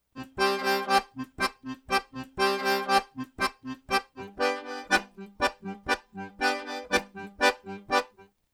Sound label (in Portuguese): Acordeon Arrocha Simplificado